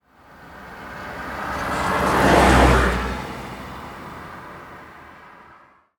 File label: van pass by; transportation